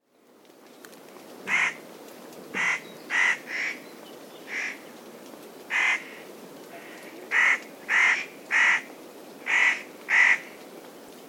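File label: Spotted Nutcracker; hoshigarasu; kamikawa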